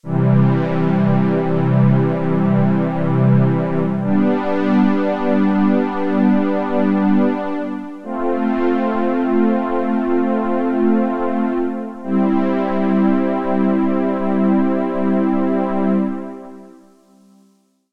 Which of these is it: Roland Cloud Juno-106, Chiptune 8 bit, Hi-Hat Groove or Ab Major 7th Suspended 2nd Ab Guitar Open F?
Roland Cloud Juno-106